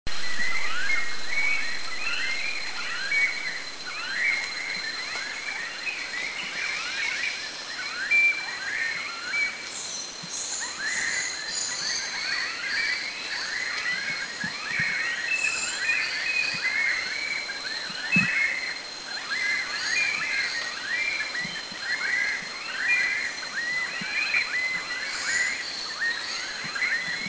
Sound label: Rufous Coucal Subic; Rufous Coucal Centropus unirufus Near-threatened (NT) Country endemic; Up to 4 groups encountered daily at Subic.